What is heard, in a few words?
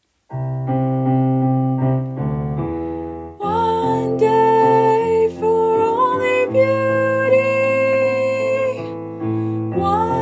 soprano